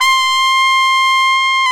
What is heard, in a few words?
BRS AKG TP, Tp 6 AKG Jaz; Roland L-CDX-03